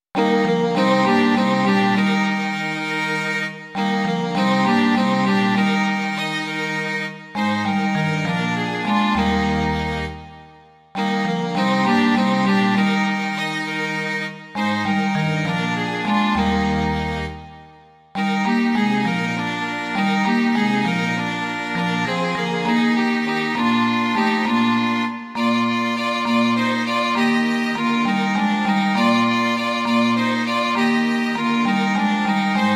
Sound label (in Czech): 2 housle, vibrafon a kontrabas; Co se tak svítí v Betlémě; Imaginativní verze